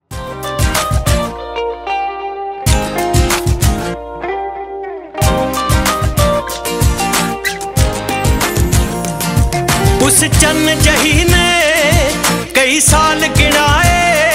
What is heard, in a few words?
Punjabi Song Ringtones